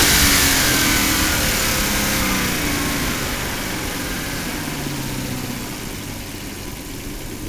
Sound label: ENGINE DECEL